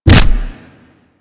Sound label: Generic Ability Impact; DE; Warframe; Warframes